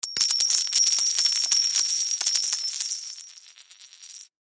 Coins Drop Multiple, Coins Drop Multiple Pirate Gold; Coins Drop Multiple Pirate Gold Concrete; Money; Foley; Blastwave